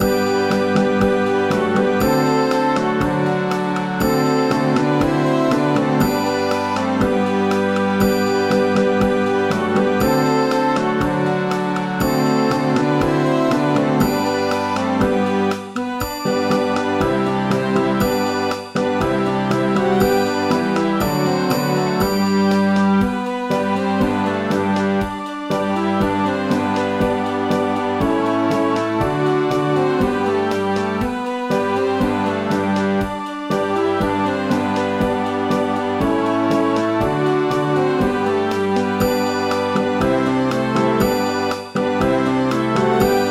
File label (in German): Streicher; Campana Sobre Campana